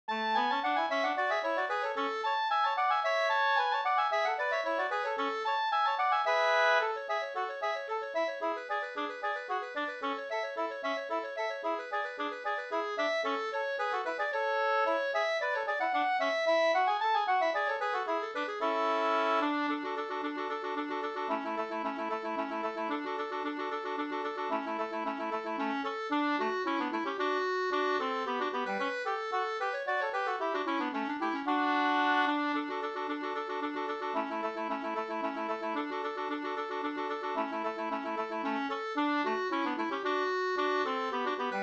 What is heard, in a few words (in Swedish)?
polska